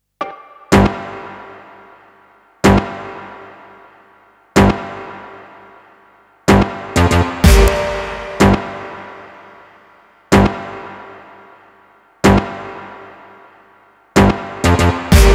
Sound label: STABS MUSIC LOOP 125 BPM